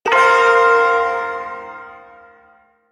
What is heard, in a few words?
Ersatz Shawzin Hirajoshi Chord; Warframe; Duviri Ersatz Shawzin